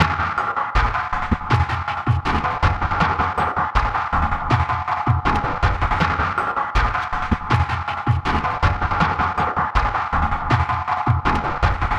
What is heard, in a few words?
Percussion Loop; 80; Drums & Percs; Percussion Loops; Ghosthack; Paradox